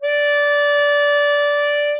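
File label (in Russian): губная гармоника нота си b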